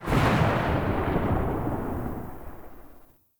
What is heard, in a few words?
Concrete Archgun Tail; Warframe; Archwing